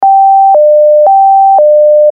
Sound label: Hi Lo